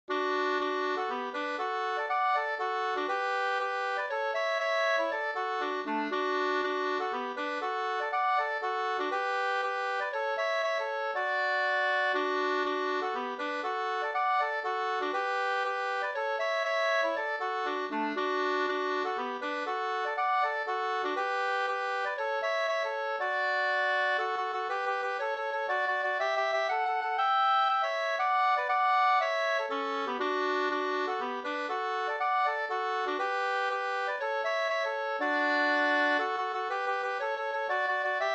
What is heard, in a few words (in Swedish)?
hamburska, 2st; Kavelbrolaten; Leffler nr36 Sd